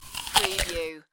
Eating, Bite, Munch, Crunch, Person Bites Into Apple